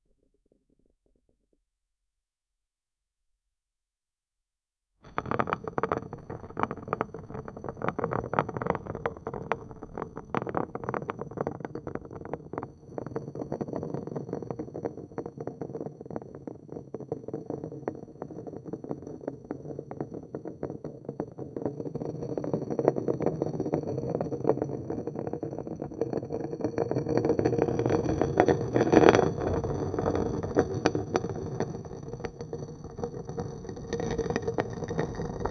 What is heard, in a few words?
rock tumble and moving, rocks